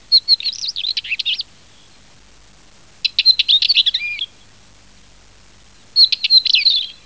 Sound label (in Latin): Oenanthe oenanthe